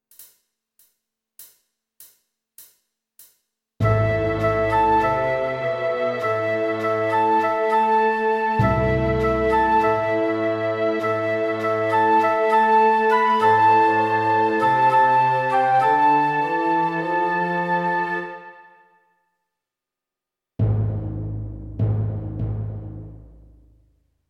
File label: New Melody Symphony Orchestra, Querflöte